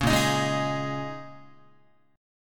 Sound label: A sharp Minor 11th A sharp down Guitar, chord; strummed; JGuitar